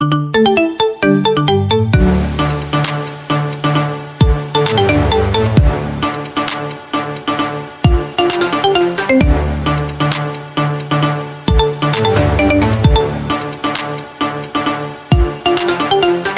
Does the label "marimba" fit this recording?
Yes